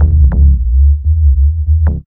B LOOP

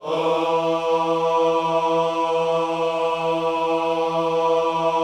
OHS E 3, Gospel; Spectrasonics; Vocal Planet Vol.1 Gospel; akai

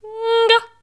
A#4, Voice

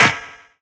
SNARE; E-Lab; X Static Goldmine 2

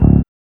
BASS

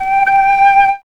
FLUTE

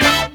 HORNHIT, HORN STAB; E-Lab; X Static Goldmine 2

